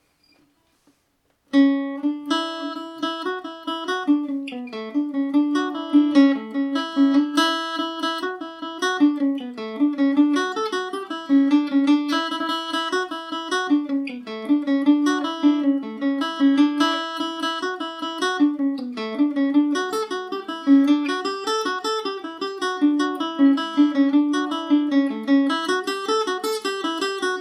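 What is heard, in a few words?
D major, irish mandolin; The Priest And His Boots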